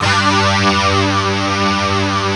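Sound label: distort, Fairlight; East West; Global Sounds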